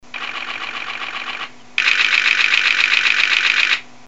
Camera Muzzle Prova Rumore

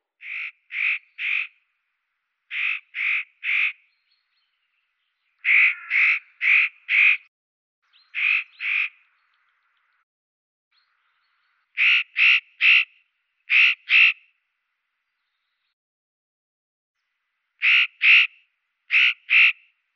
Nocciolaia Nucifraga caryocatactes; Versi Animali; Il Forum della Fotografia Naturalistica

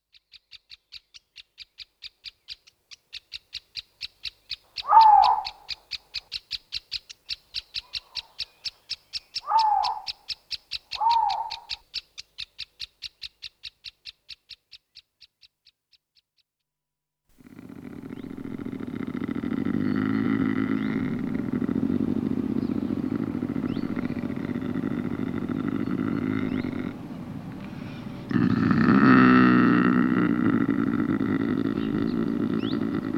Lince europea Lynx lynx; Versi Animali; Il Forum della Fotografia Naturalistica

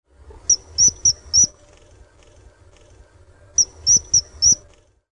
martin pescatore alcedo atthis; Versi Animali; Il Forum della Fotografia Naturalistica

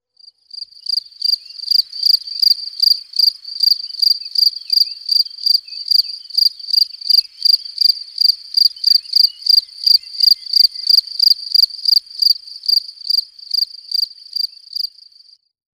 Prato Grillo Grillus campestris; Prato Fiorito; Versi Animali; Il Forum della Fotografia Naturalistica

